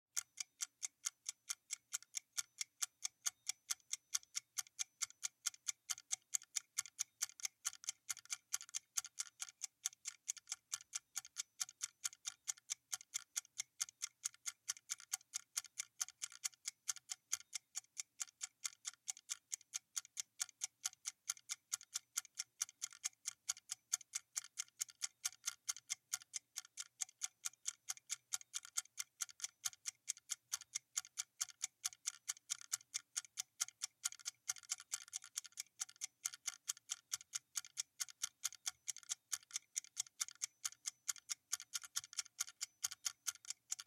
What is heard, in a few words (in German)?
eieruhr; haushaltsgerausche bilderbingo